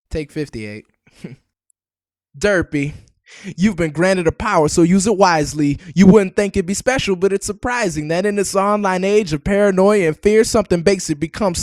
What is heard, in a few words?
vocal; Acapellas